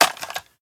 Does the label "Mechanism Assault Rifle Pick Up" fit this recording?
Yes